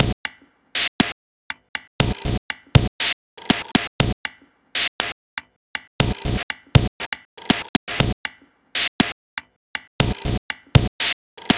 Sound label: Noise Beat